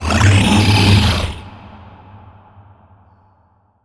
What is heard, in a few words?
luteboss cascade; Crypt of the NecroDancer